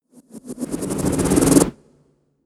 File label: whoosh flutter